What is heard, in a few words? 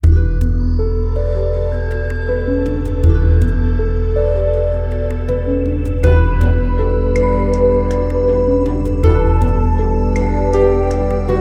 Bgm town settlement